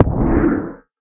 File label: elder hit, guardian; minecraft; mob